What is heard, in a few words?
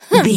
voice female effort jump action